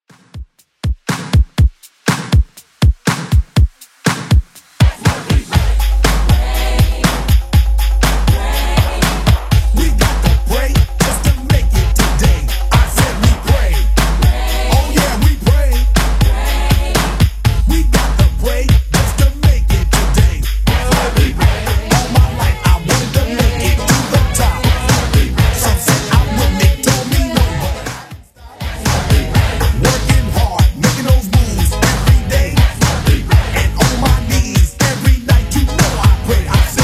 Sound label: 90s Simple Redrum 121bpm